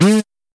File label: interupted, combat; CosmicRage